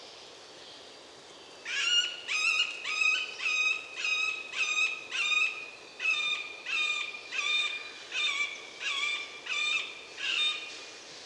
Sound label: dendrocopus medius ruf